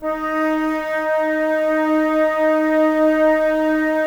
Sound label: Flute, Wood Winds; Miroslav Vitous - Woodwind Brass Ensemble; 90's Sample CDs